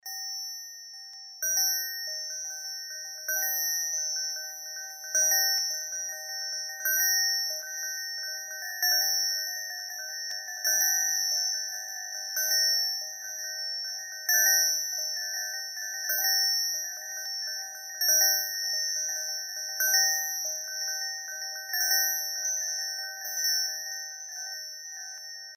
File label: chime, dark ambient